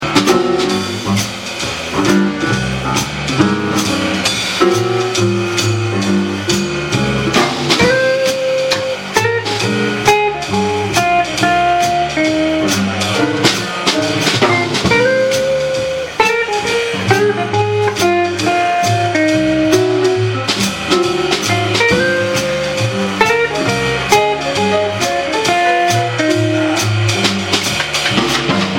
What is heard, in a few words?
jazz session intro